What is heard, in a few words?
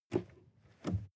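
sports car interior pedal brake medium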